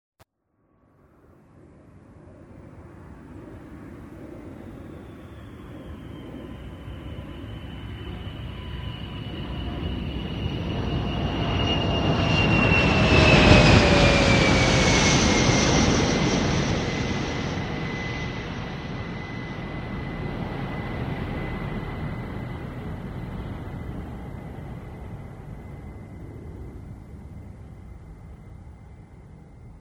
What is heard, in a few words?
Sr 71 Landing; SOUND EFFECTS1